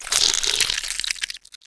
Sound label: King Spider Idle